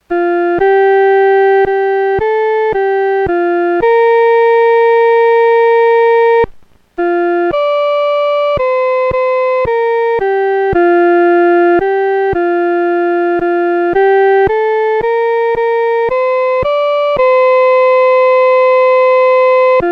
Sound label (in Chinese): 独奏 第一声